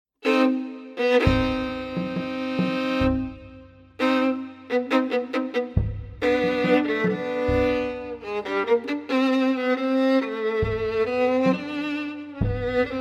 Three Dances; Tango, Waltz; Igor Stravinsky; An American Soldier's Tale; L'Histoire du Soldat

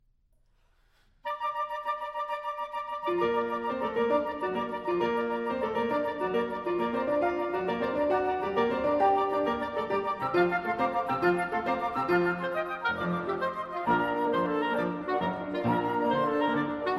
Sextet In B-Flat Major, Vivace

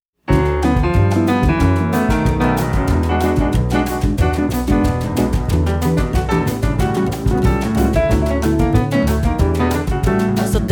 Samba

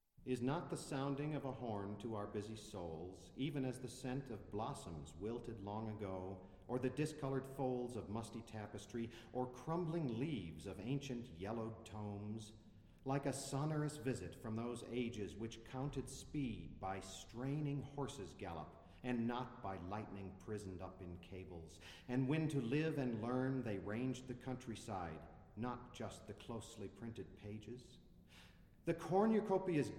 Sonata For Alto Horn In E Flat & Piano, Lebhaft

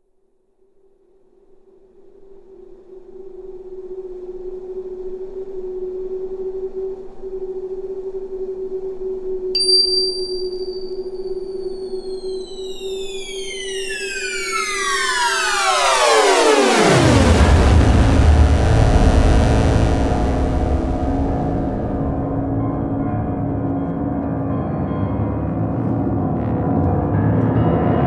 Intercontinental Concerto for Trumpet

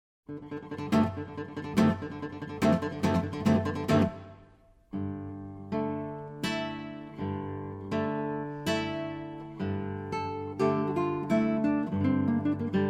Guitar; Castelnuovo-Tedesco; 24 Caprichos De Goya; Brabisimo!

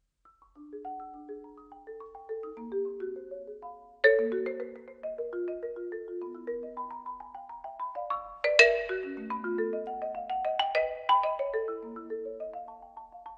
Marimba Music - Part. 2. Variations

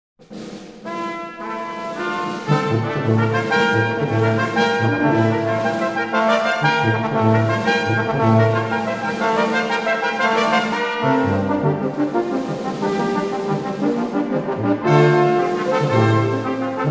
Fanfare, Brass Quintet; Atlantic Brass Quintet

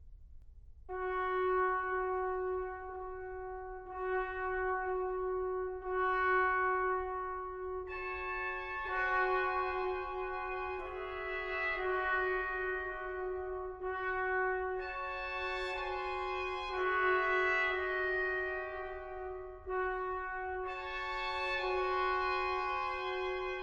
Brass Quintet, Tranquil and elegiac

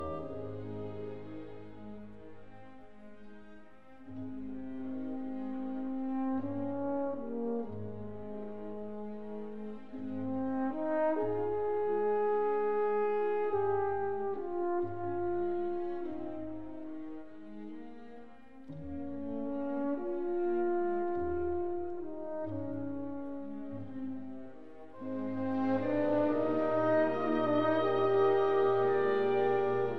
Horn Concerto, Andante; Strauss Concertos

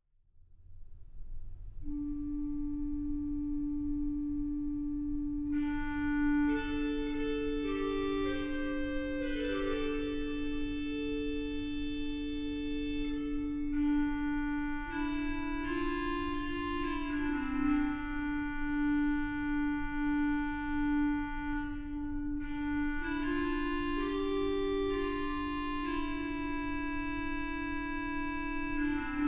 Antiphon, Brass & Organ; Karitas Habundat

